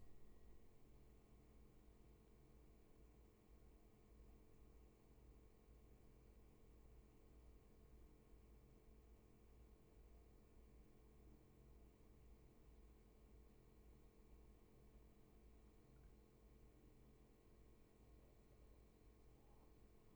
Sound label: roomtone; Flynn